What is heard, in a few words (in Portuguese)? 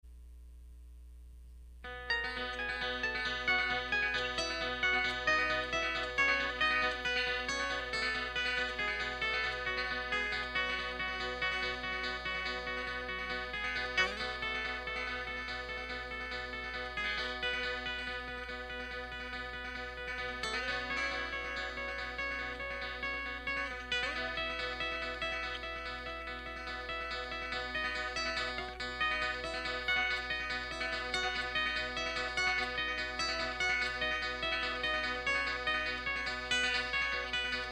violinha